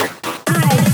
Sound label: Fills 128BPM, Clubsounds